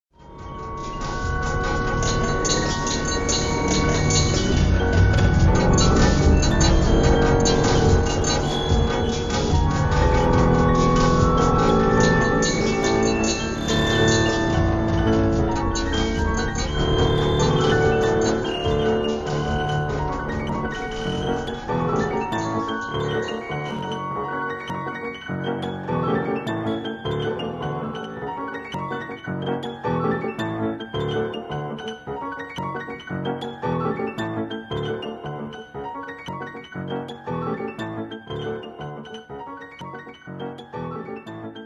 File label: Electro Ragtime